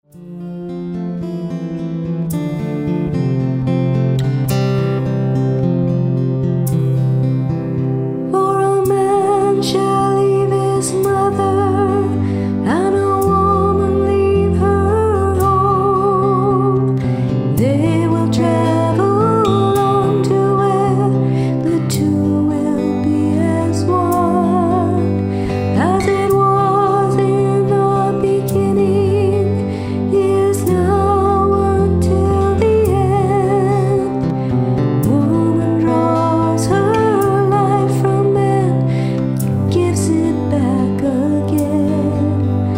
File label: wedding song